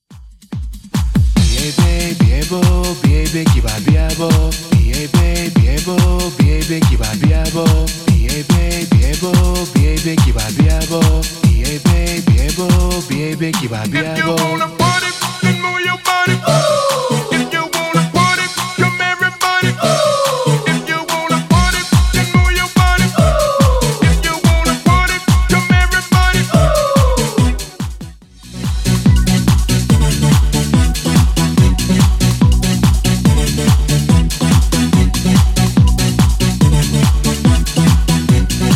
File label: 90s 143bpm; Xtend Edit